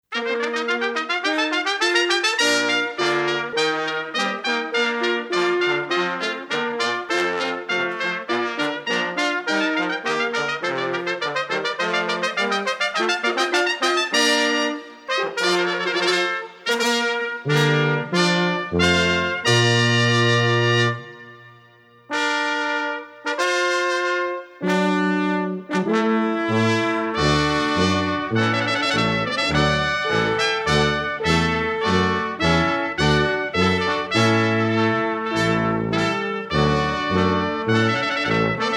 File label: Fanfare Trumpet Voluntary